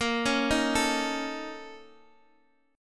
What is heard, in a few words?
A sharp Minor Major 7th Double Flat 5th A sharp Guitar Open D